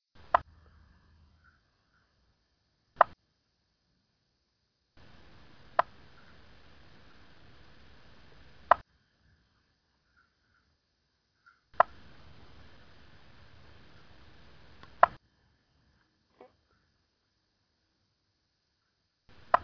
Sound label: limnodynastes peronii, frogging; Striped Marshfrog